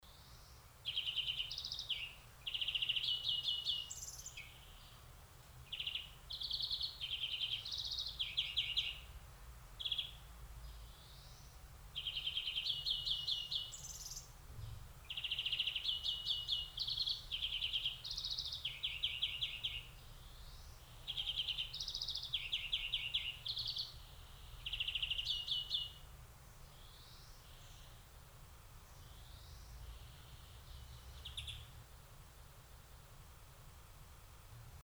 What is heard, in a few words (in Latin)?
Chloris chloris; SETE